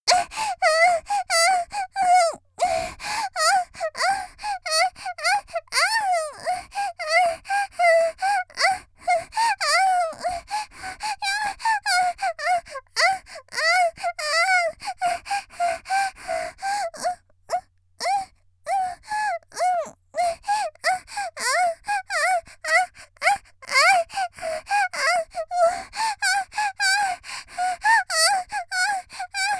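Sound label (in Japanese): 少女「喘ぎ」ノーマル喘ぎ; 元気な少女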